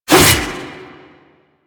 Sickle Whoosh; Lasrian Butcher Sickle; Lasrian Soldiers; Weapons

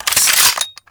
Saw Blade Launcher Reload; Warframe; Weapons

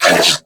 Pain Female; New War; Narmer Grineer

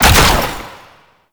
Ranged Weapon Fire; Warframe; Necramech; Weapons

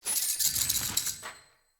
Sproutfolk Unearth C

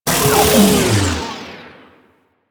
Proto Infested Mutalist Death, Vocals; Warframe

